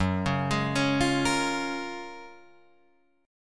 Gb Major 9th, down, Guitar, Drop D

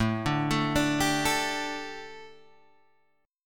A 7th Suspended 4th A, chord; A7sus4 arpeggiated; 5,5,7,7,8,5

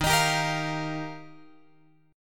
D sharp Major Add 9th

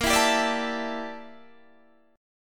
G Minor 6th Add 9th Bb

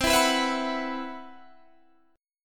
C Major 7th Flat 5th C, Guitar Drop D

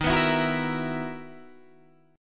B Major 11th Eb, guitar

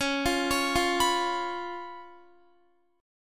Db 7th Db down Guitar; Db7 arpeggiated; tuning=4F,4A,5C,5G,5C,6E

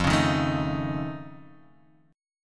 F Augmented 7th F; 13,16,13,14,15,x,x,x

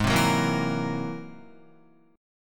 G sharp Augmented 9th, Guitar; G#+9 strummed; 3,3,6,3,4,6; JGuitar

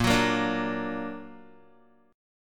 Bb Augmented Bb, Guitar; strummed; JGuitar

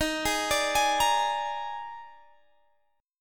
D sharp Major 7th Suspended 4th, Guitar, Standard; arpeggiated; x,18,18,19,21,18; JGuitar

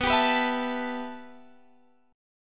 G 6th Add 9th B, chord

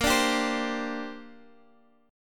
Bb 6th Add 9th, Guitar, Open F; strummed; x,x,10,9,10,7; Bb6add9

